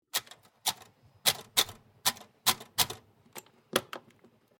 ADDING MACHINE SIEMANS ELECTRIC 1950S SEQUENCE, Electric adding machine; Typing; Offices; SoundEffects-Foundation1